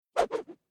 Whoosh Swish Sweep Long Bamboo Cane Three Swipes Varing Pitch; Staff And Rod